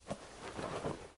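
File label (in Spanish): SOTANA, cassock; yesterday origins gamerip 2016 switch ps4 pc ios macos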